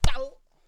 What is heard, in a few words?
Punch